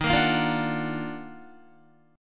Eb Minor Major 9th Eb down Guitar, chord; JGuitar

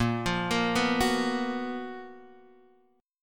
Gb Major 11th Bb, chord

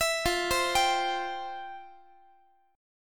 F Major 7th Suspended 2nd F, Guitar; arpeggiated

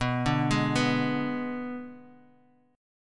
B Minor 6th B; Bm6 arpeggiated; 19,17,18,16; Bass Standard; JGuitar

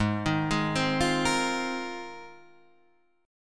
G sharp 6th Add 9th G sharp

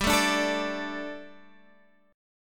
D Suspended 4th G

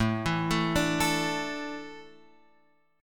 A Suspended 4th down Guitar Open F; Asus4 arpeggiated; JGuitar

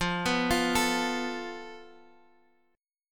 F Major Flat 5th, Guitar, Standard; arpeggiated; x,8,9,10,10,x; JGuitar

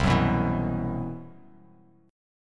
C Major 7th Flat 5th C; CM7b5 strummed; JGuitar

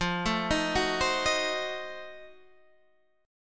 F 7th Flat 9th F down Guitar Standard; F7b9 arpeggiated

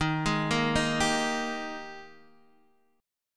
Ab Major 7th Suspended 2nd Eb

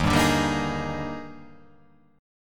Eb Major 11th, chord; EbM11; strummed; JGuitar

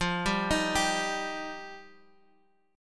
F 7th Suspended 2nd F

